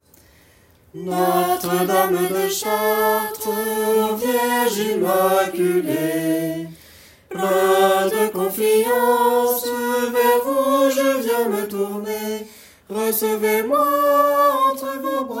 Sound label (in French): Chant du Jubile Refrain famille